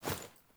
Foley Action Default Jump Land, Foley Default Clothing; Characters; Player